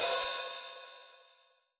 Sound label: Roland SC-88 Open Hi Hat